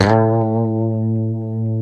A 2, COMPR STRAT, Guitars; Spectrasonics; Hans Zimmer Guitars VOL-1; 90's Sample CDs

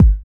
Kick, Kickdrum; Equipped Music; Loungin House

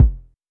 Polite kicks E1, Short; LIMAT Div Dept; Drums

